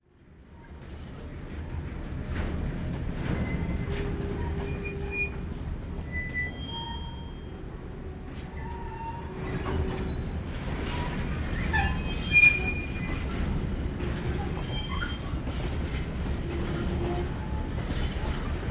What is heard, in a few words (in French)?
portee ferroviaire grincements musicaux